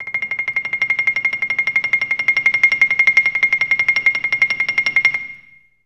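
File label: TREMOLO C7, Orchestra; Best Service; Peter Siedlaczek's Advanced Orchestra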